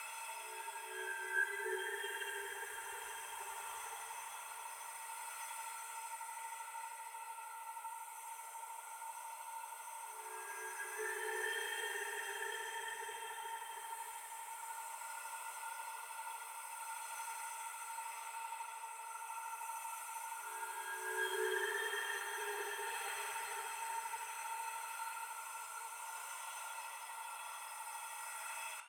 mefistotel idle, anomalies; Arrival

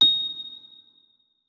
notes, keyboard, electric piano; Hideout Furniture

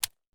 aps firemode switch, weapons; pm; Mask's Reanimated Anomaly Arsenal

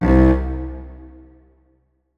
notes, keyboard strings; Hideout Furniture

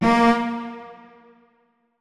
notes, keyboard; Hideout Furniture

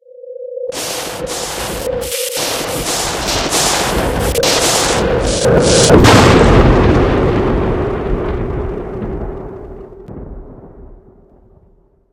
emi blowout, anomaly; Soundscape Overhaul

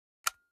switch mode, weapons; ACE! 52

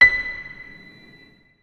notes, keyboard piano; Hideout Furniture

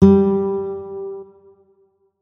notes, guitar; Hideout Furniture

